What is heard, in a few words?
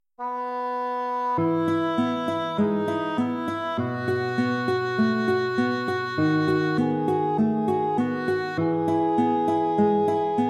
etude major; chopin